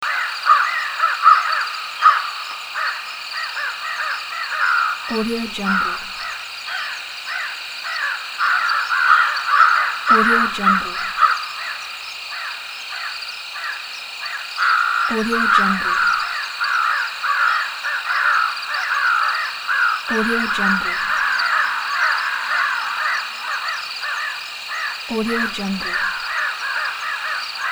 crow calls and insect ambiance loop

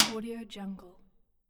small metal hit

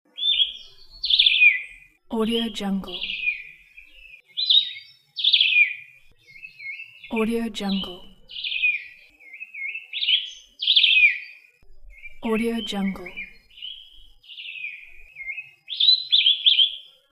coastal forest birds calling

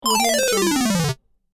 retro 8bit video game falling sound

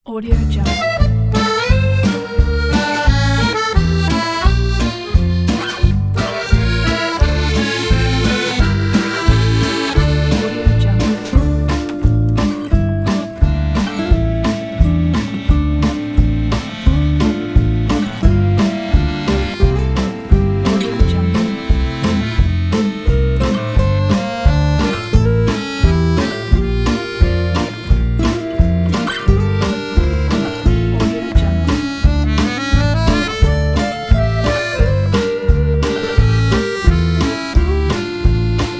french accordion

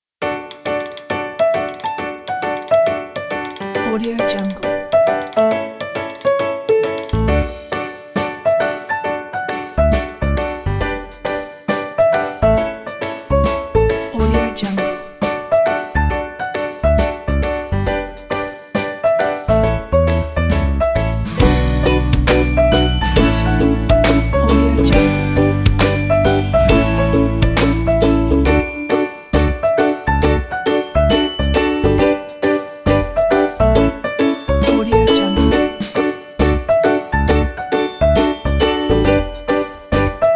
ukulele and whistling; music finder

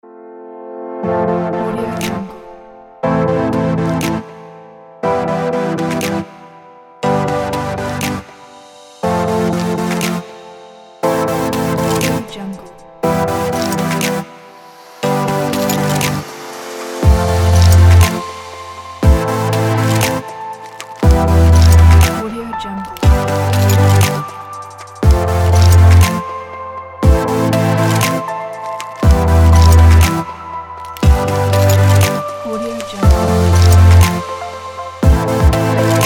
abstract future bass

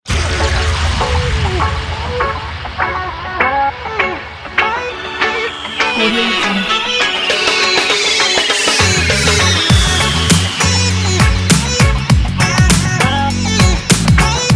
hiphop reggae

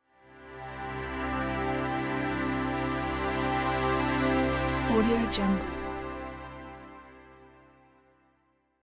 soft pad transition; smartsounds

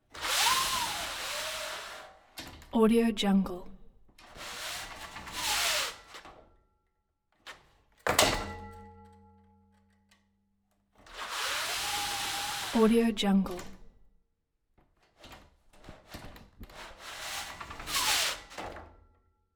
house projector screen medium; collanderson

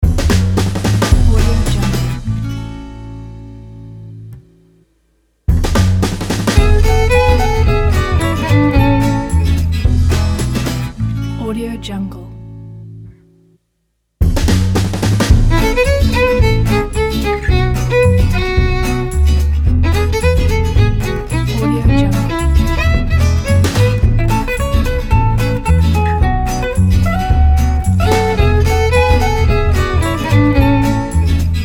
jazz violin logo

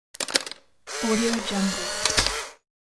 polaroid sound; almira23

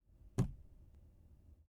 vehicle door handle

